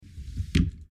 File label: MedicalScientific; Soundfx III